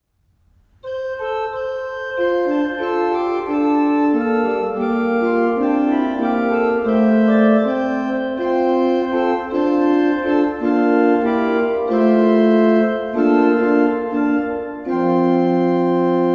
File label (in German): orgelvorspiel; Hörbeispiel "So lang es Menschen gibt auf Erden" GL 300 / GG 425